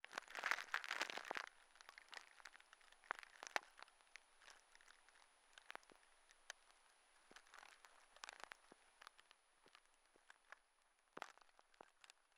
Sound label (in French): Craquement Brindilles; Petite Ceinture